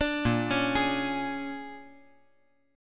Bb 7th Sharp 9th Bb; Bb7#9; arpeggiated; JGuitar

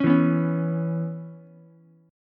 Db Minor 7th Db down Ukulele; strummed; JGuitar

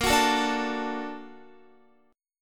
A sharp Augmented Major 7th A sharp Guitar; A#+M7; strummed; x,13,16,14,14,16

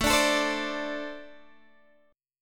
A Minor Double Flat 5th, Guitar, DADGAD

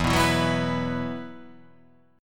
E Suspended 2nd; x,7,9,9,6,6,6,8

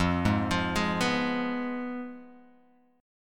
F Diminished, Guitar, Open G; 3,1,3,1,0,x; down; chord shape

